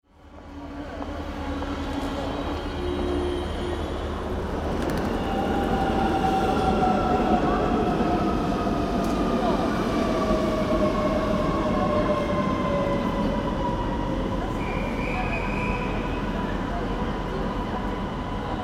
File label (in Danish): S-tog; S-tog #4; Karl-Erik Thorup Pedersen